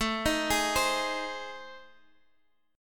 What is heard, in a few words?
A Minor Major 7th Flat 5th, Guitar Half Step Down